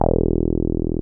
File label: 303 OW E2M, 303 OW BS; Drums In Your FACE!